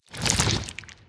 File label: slither short; smite; scylla